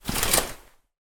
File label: household curtains draw hotel